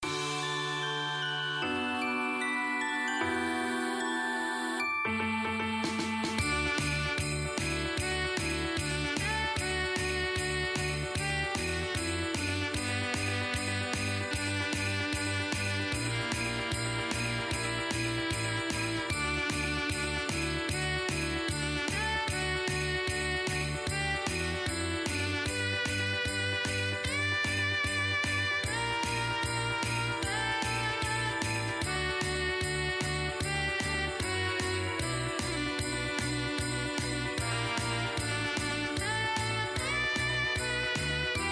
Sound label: xmas music loop; christmas2016